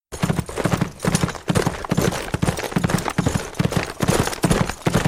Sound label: gallop Short